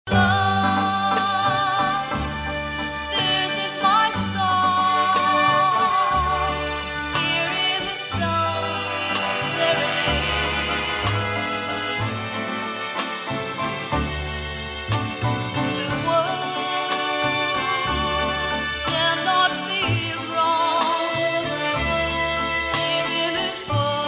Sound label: reverb